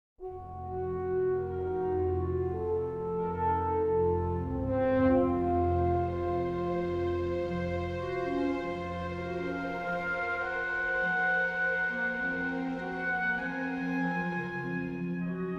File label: World Music; Jurassic World Evolution 2; PS4; Windows; Xbox One; Xbox Series X/S